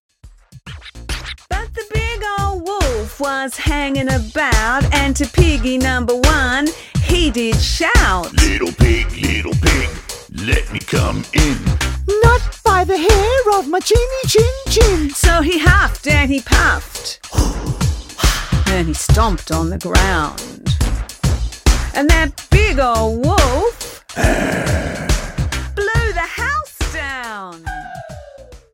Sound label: Rap; 3 Little Pigs